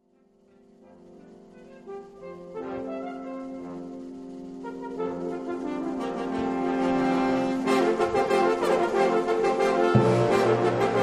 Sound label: vienna horns